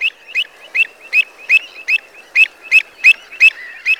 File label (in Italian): avocetta